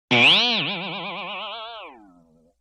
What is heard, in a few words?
Boing Box Short Hit